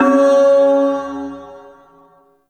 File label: Shawzin Oct One C Sharp; Music; Warframe; Duviri; Ersatz Shawzin